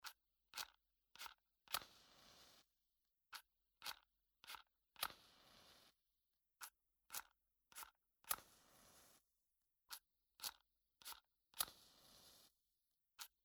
Allumette

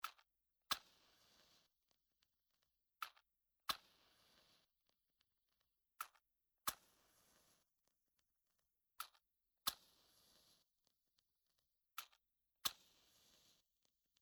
Allumette, binaural

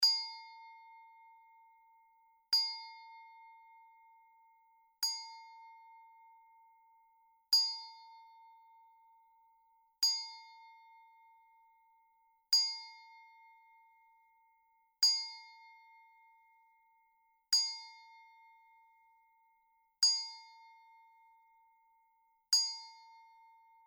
Cristal

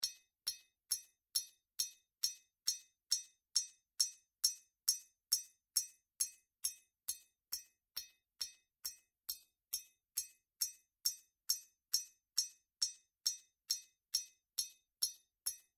Bris, binaural

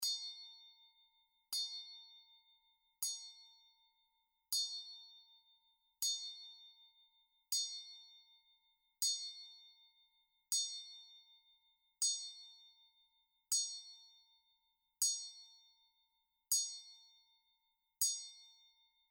Sonnette

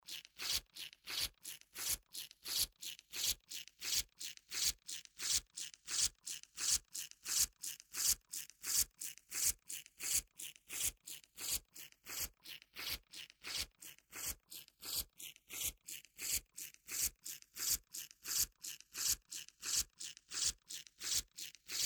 Papier

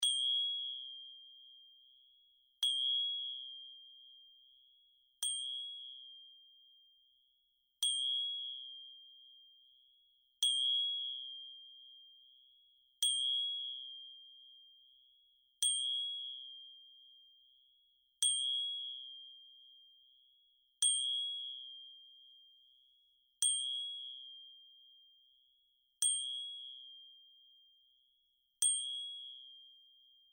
Cristal

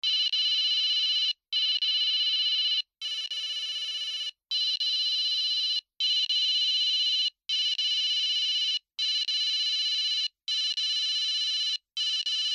Sonnerie

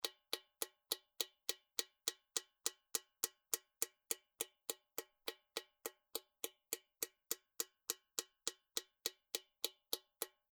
Plomb

